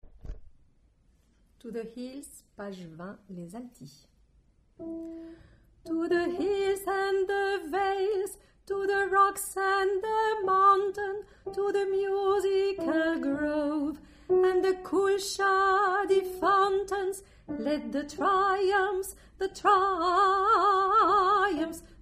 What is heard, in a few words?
to the hills Alto